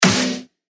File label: Snare Flinch; revealed claps snares vol 2